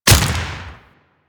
Deathshot Default, Bullet Impacts; Warframe; Weapons

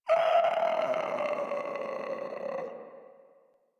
Infested Runner Death; Warframe; Runners

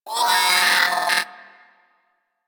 Hyena Micro Vocal Death; Warframe

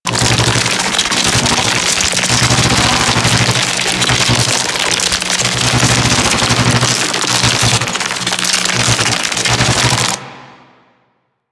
Octopede Un Heal Beam End Loop; Warframe; Man In The Wall

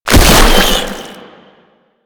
Maggot Pain; Enemies; Vocals; Warframe; Proto Infested

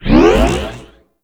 Anchors Away Loop Start; Warframe; Weapons; Archwing; Powers; Archwing Healer

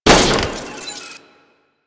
Electronics Misc Destroy; Warframe; Ambience; Nineteen Ninety Nine

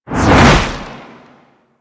Arch Wing Dodge Roll Left Low, Vehicles; Movement; Warframe

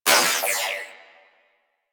Alt Shot; Weapons; Warframe; Tenno; Prime Tenno Miter

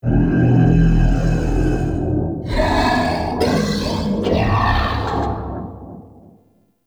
Cave Root Chatter, Ambience; One Offs; Neathuns Root Cave

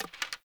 Hunter Bow Ranged Equip; Weapons; Player; Bows; Envoy Hunter Bow Ranged Melee

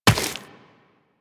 Run Rock, Footsteps; DE; Warframe; Tenno

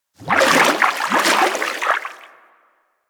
Fishing Spear Fish Spook; Warframe; Weapons; Eidolon Fishing Spears

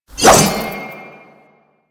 Sword Swipe; Warframe; Duviri; Duviri Dax; Weapons

